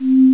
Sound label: Whistle (C3); X-Wing; X-Wing Patches